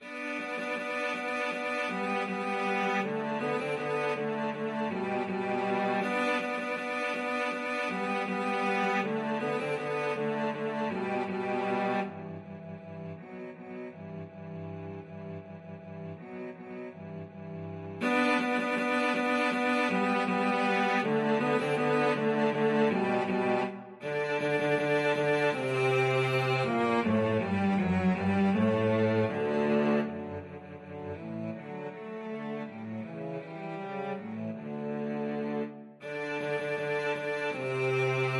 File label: cello duet